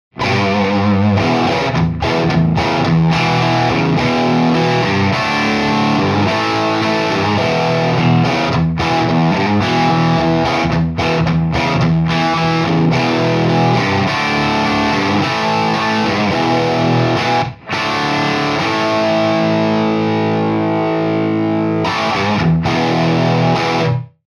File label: marshall 100w; m75 lp